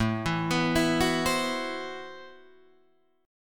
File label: A Minor 6th Add 9th, Guitar Open D